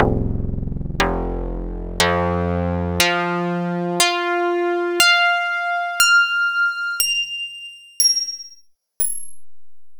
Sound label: digitar; Karplus